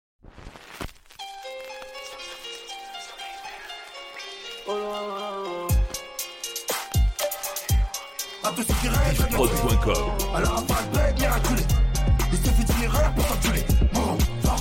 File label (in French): Medley Rap Urban FR